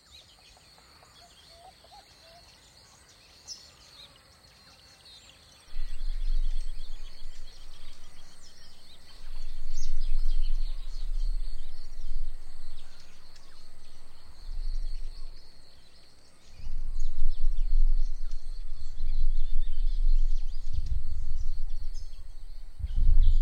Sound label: major bass